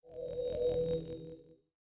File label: Stalactite; Blind Quest - The Frost Demon